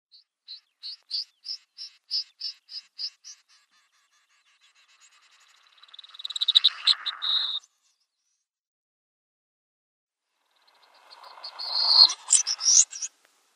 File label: Pintail Snipe drumming